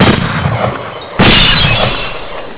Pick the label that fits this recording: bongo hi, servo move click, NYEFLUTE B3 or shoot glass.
shoot glass